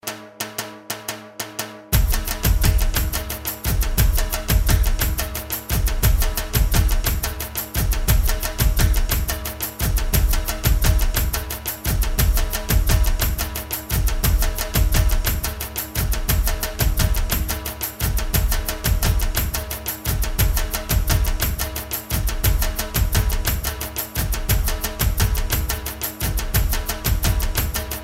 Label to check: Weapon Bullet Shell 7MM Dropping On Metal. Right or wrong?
Wrong